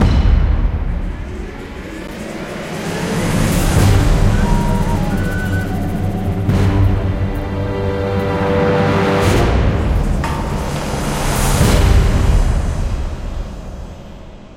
Action Sting; Jurassic Park The Game

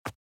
grab Item; Out of Reach: Treasure Royale

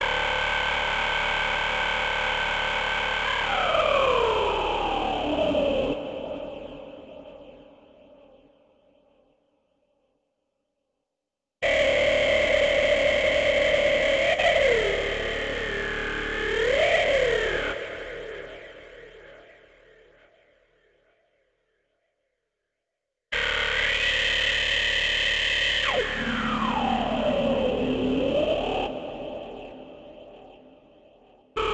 Ambient 1-warstwa 2+

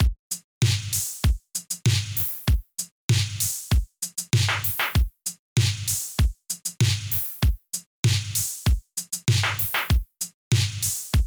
Main Drums 8 Bars; Vostok Inc.

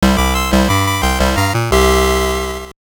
Jingle; Digimon Adventure 02 Digital Partner; WonderSwan

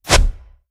Magic Box Appear; Sfx - Magic Box Appear 02

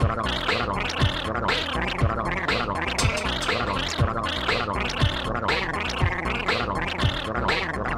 Cartoon; Pit and Temple B

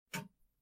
Clock Tik

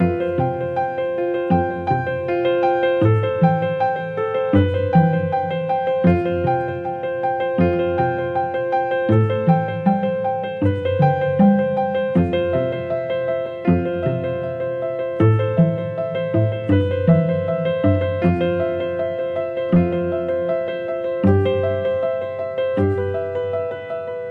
Music Level Discovery Layer

